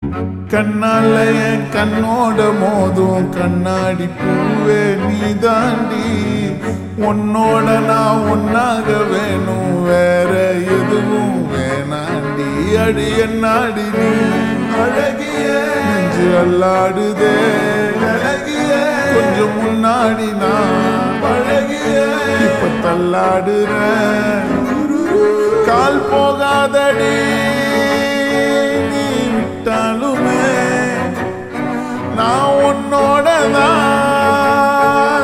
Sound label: Ringtone Slowed Reverb; tamil; retro; suriya